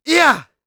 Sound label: throw; UFC Tapout; XBOX